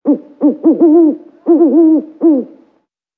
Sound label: owl dist; amb elements; modern warfare 2